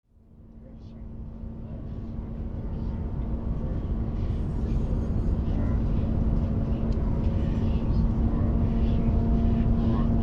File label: Airliner Landing Interior - Passengers Small Cheer On Touchdown; Airplanes